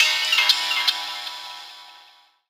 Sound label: RAW JAZZ FX; Rawcutz; Nu Forms